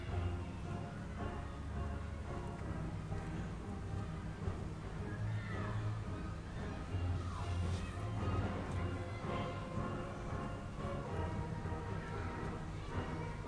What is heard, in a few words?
school rehearses steel drum version of song; hackney